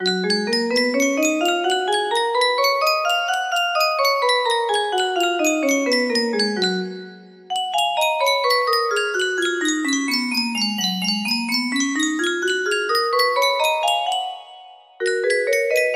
f sharp major, music box

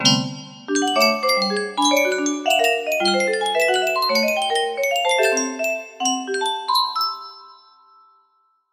music box, music box melody

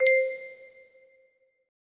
melody

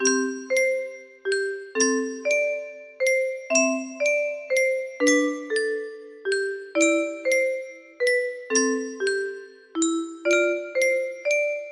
music box melody

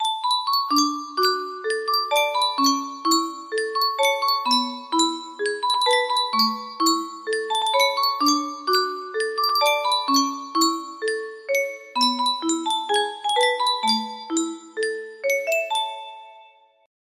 music box, music box melody

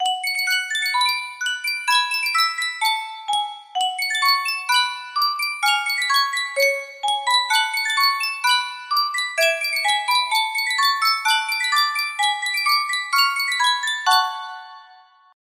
yunsheng custom tune music box

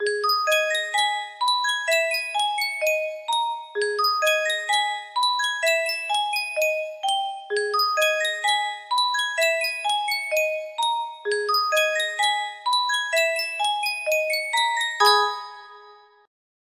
music box

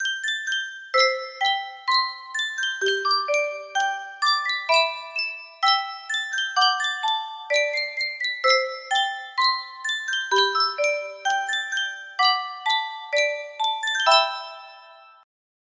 yunsheng music box, melody

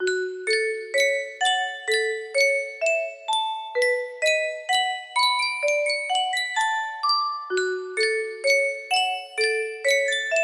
music box, music box melody

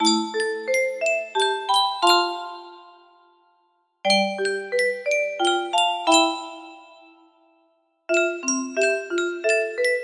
piano; final fantasy x

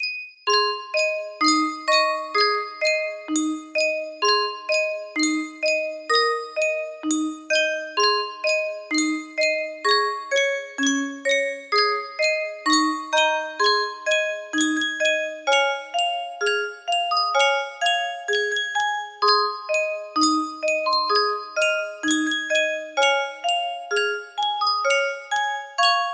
Sankyo 23 note music box

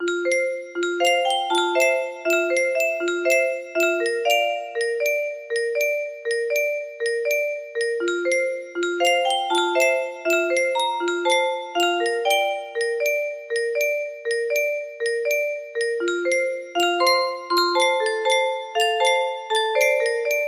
arpeggio, music box melody

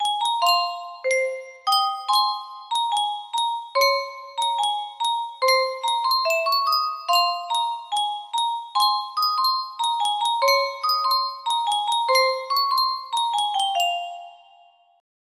yunsheng custom tune music box

